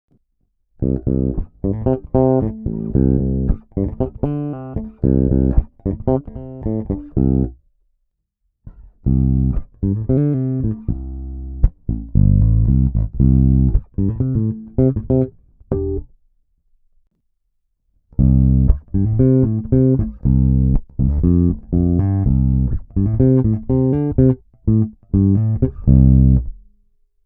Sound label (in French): basse